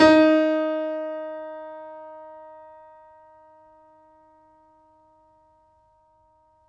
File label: FF D#3, Grand Piano off mic; AKAI S6000 CD-ROM - Volume 2